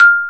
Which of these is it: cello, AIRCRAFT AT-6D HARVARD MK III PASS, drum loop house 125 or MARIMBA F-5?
MARIMBA F-5